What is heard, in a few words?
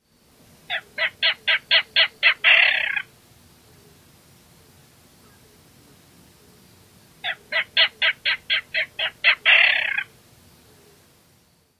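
k-k-k-keerrr call, Clapper Rail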